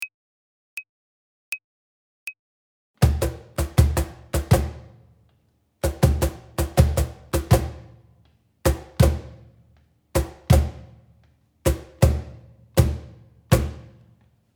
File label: Samba Intro